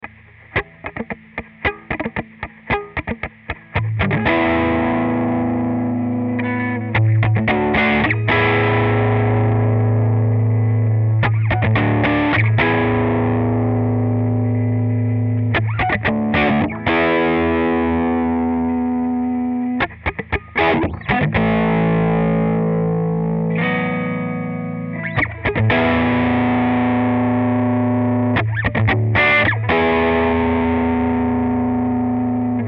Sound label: E 112 GTR Riff Trashed